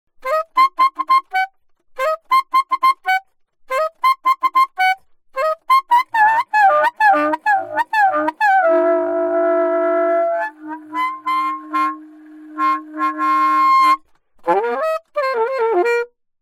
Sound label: altosax